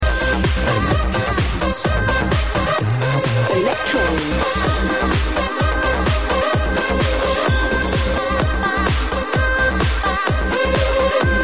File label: Salsa